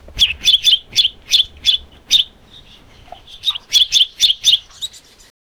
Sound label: stern rabbit chatter